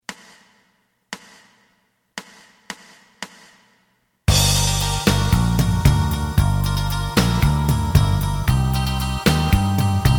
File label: lead